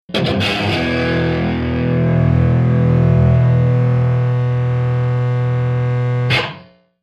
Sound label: Elect Guitar Feedbac, guitars